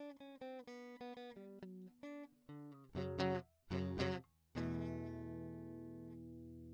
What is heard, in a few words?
bell tolls clean; pixel drive; digital symphonic products